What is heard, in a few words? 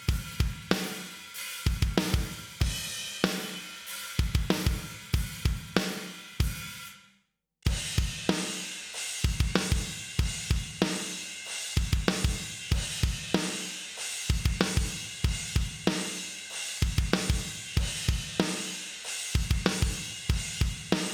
Tama Bubinga metal; SlyDrumsamplesDemo